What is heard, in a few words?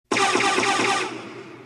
blaster sound effects; star wars